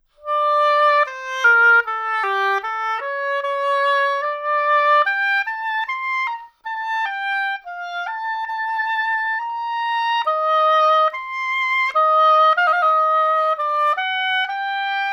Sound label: Oboe